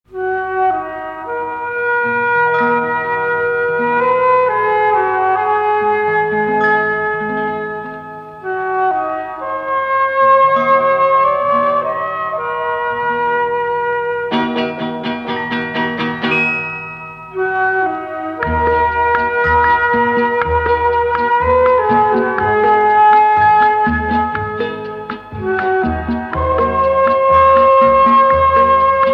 Instrumental; Gambler (1971)

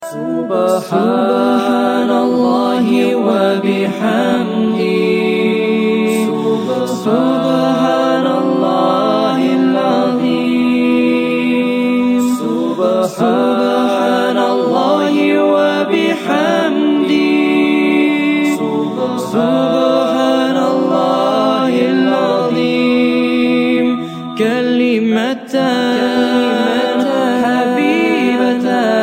Islamic Nasheed; Islamic Ringtones

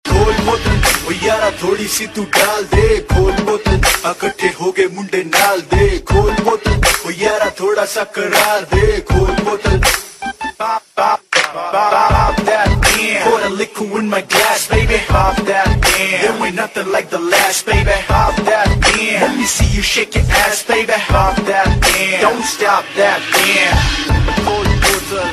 Ringtone, Punjabi

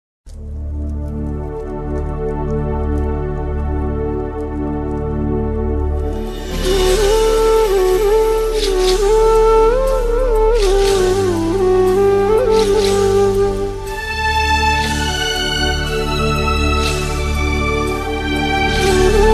Pandavas Bg Sad Tune (Ho Ho Hoho); Tv Serials; Star Plus; Mahabharat

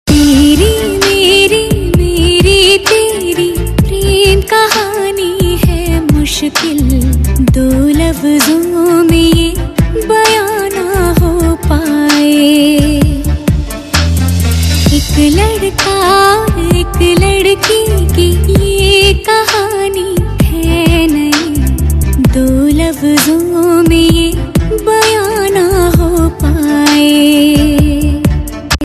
Female; Bollywood; Bodyguard

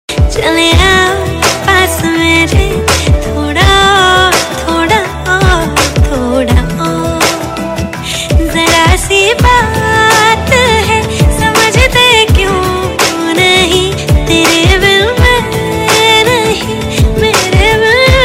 Female Version Ringtone; Bollywood; Ranchi Diaries

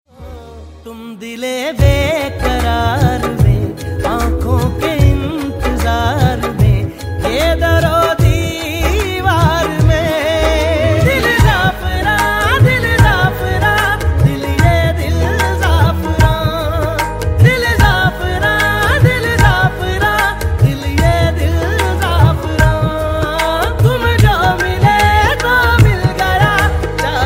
Punjabi Ringtone

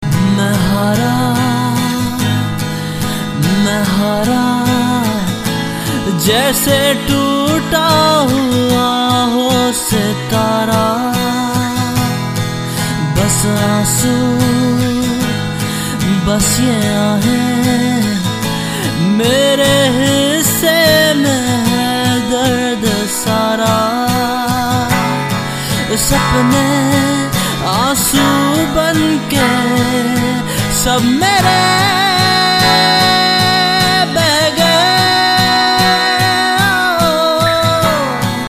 Acoustic; Indian Pop

